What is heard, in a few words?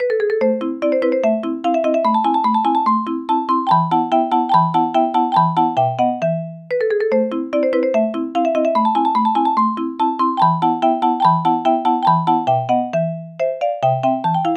marimba